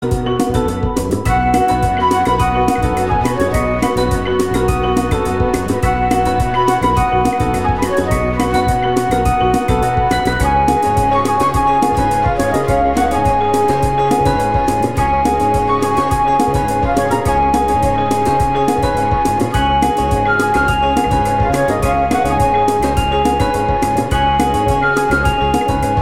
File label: Lively Latin, RINGTONE; MIUI12